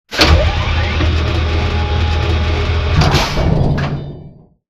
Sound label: conveyor close; corporate; spectre conveyor; Titanfall 2